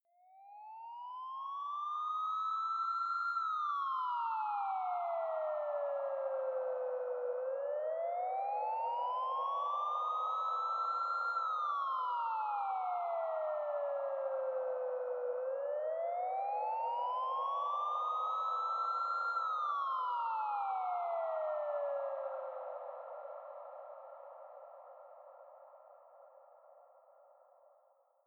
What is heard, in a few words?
space police cop sirenes